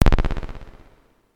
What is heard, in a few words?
damage